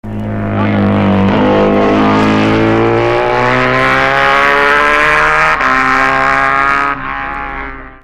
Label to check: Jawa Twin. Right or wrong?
Right